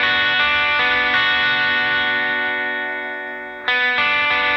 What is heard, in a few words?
Guitar, Indie Rock; Sonic Foundry (Sony Creative Software); Indie Rock Anthems